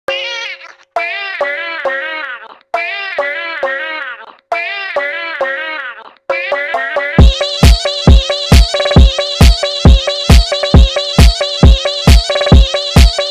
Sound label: phonk remix